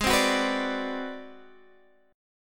G sharp 7th Sharp 9th Flat 5th G sharp, down, Guitar, Half Step Down; chord